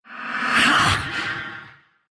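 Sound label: pain, Russian; Dota 2; arc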